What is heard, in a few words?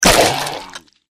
trog death